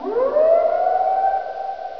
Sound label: alarm